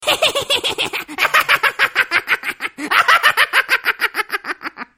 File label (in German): Dummes Lachen